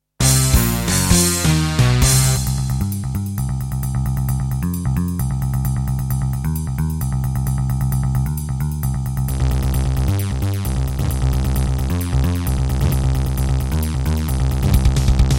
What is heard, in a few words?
Anteg EasyMIDI EM-100; sigil doom episode 5